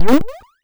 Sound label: subscreen open; metroidvania